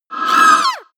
wraith hit; schreie

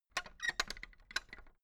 fence gate latch rattle long; objekte; tueren

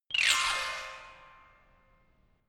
notification glockenspiel horror slide down hard

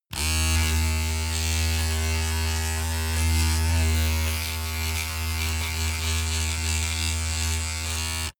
razor on load pov short

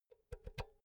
power cord insert soft; objekte; elektronik

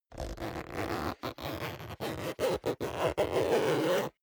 zipper down jacket close unstable; objekte; reissverschluss

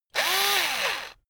electric drill screw out medium; maschinen; bohrer; soundpool

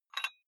dumbbell take off very small weight gentle

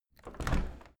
window handle open soft; objekte; fenster

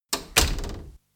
pulling door shut

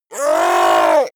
goblin scream short pain

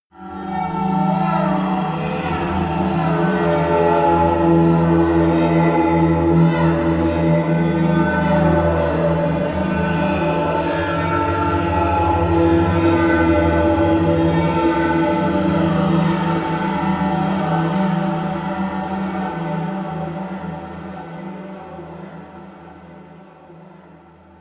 drone hell swirl

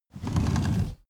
car seat slide forward fast

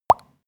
pop bubbles; soundpool

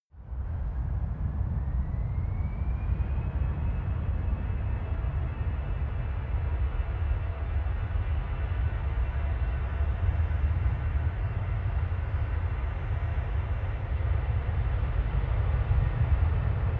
plane flyby land rev; flugzeuge verkehrsflugzeuge; verkehr transport

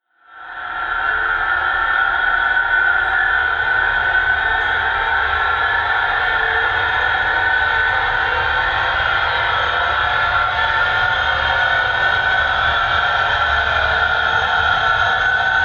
ascending chaos, horror; atmosphaeren umwelt

